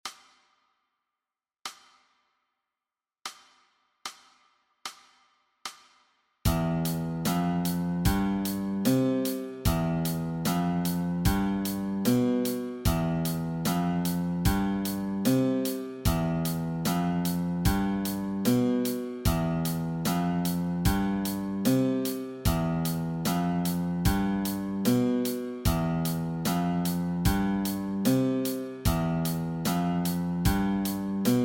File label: Guitar Exercise